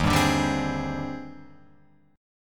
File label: D sharp Major 13th D sharp Guitar Open F, chord; strummed; JGuitar